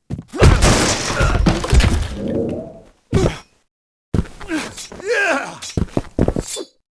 tavern duel enemy falls; Sid Meiers Pirates; XBOX

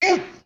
"Ooowwww"; Championship Manager 2006; XBOX

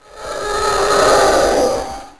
tie pass; tie fighter

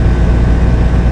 air conditioner hum; Tony Hawk's Project 8; XBOX

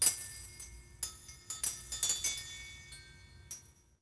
banquet hall chimes; Star Wars Obi Wan; XBOX

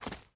grass, footsteps

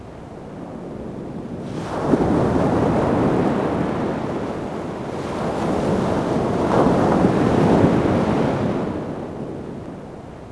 ocean; Splashdown; XBOX; Soultree; Barrier

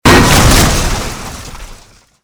telephone pole; Soldier Of Fortune II Double Helix; XBOX; ambience

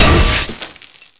bust valve; hits; Tony Hawk's Project 8; XBOX

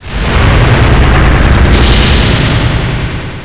earthquake; Tony Hawk's Project 8; XBOX

